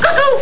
cuckoo

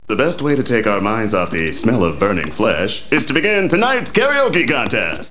karaoke

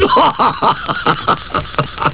HAW HAW

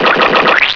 splats

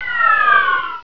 firestorm fire

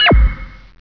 smart bomb

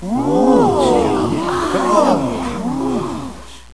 amaz gasp

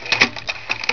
Slide Projector

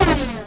prelim explosion

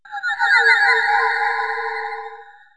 16-Bit Alien Call Beep

